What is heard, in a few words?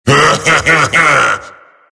laugh; tf; skeleton king